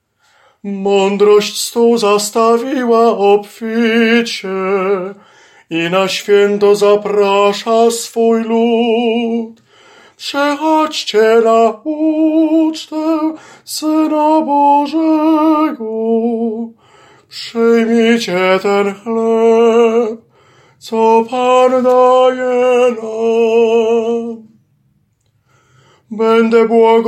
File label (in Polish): TENOR; Madrosc stol